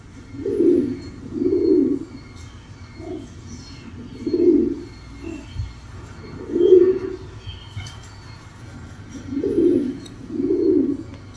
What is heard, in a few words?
Rock pigeon Cooing